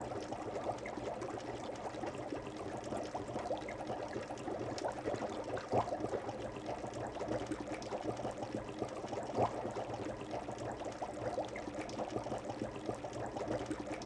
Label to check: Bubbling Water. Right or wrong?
Right